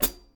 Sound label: Hat Pedal Soft; hydrogen drum samples